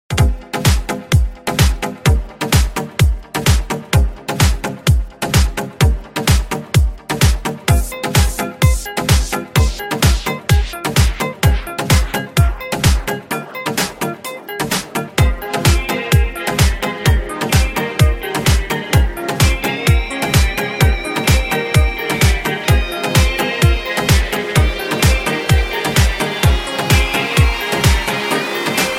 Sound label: house music